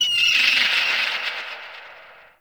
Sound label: Corrupted Beach Seagull Day; Ambience